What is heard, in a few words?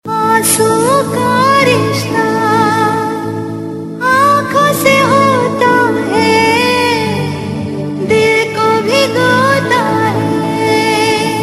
Sad Version